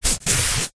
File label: foley foam camping mat hand rub